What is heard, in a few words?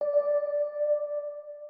organ